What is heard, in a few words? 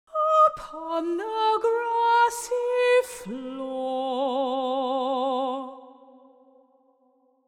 Hush, Lullay, vocal phrase